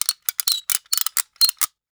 Gadget; Motors & Switches

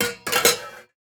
Pots Put Away, Kitchen

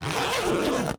Metal Zipper, Clothing

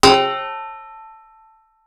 Metal Gong

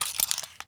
Stapler Open; Office Supplies

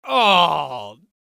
Ooooh Reaction Male; Vocals & Wallas; 1001 Sound Effects

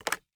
Switchboard Telephone Receiver Up; Communications

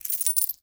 Coins in Hand

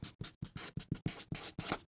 Write on Paper with Marker; Office Supplies

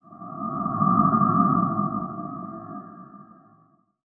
Shuttle Fly By, Sci Fi

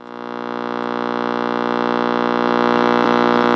AM Radio Buzz; Communications

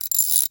Rachet; Tools

